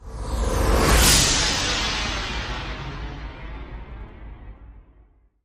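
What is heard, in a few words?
Whoosh Flash Sweep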